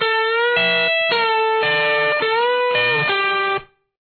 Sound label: lead, guitar